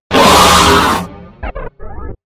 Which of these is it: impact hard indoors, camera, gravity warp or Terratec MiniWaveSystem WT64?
gravity warp